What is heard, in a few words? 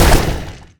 projectile impact; dota2; Necrophos